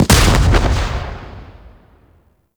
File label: Corinth Prime Alt Fire Explosion B, Heavy Shotgun; Warframe; Tenno